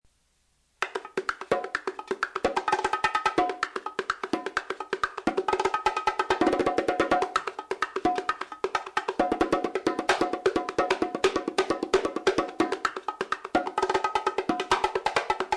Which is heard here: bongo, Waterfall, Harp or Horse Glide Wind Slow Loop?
bongo